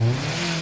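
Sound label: police engine rev attack rev up; Blind Drive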